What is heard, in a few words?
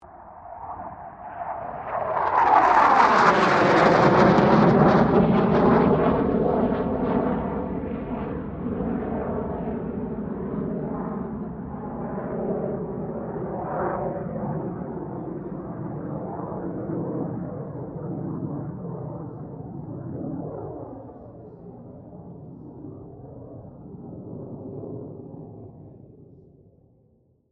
AIRCRAFT CA-27 SABRE PASS STEREO; CA-27/F-86 Sabre; Airplane; Airplanes; Vehicles